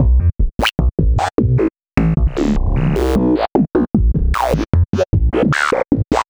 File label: Synth Bass